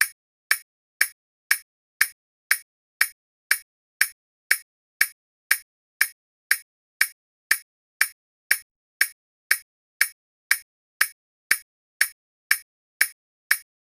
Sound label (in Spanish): sonido de ondas futurista; futuristic